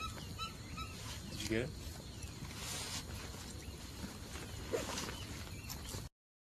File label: woodpeckers